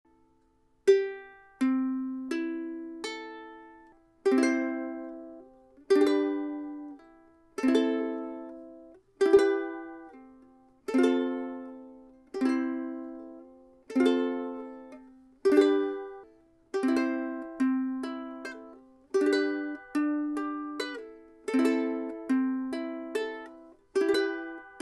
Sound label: Kala soprano, ukuleles